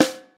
Original Snare Drum Sound C Key, snare drum sample tuned to the C note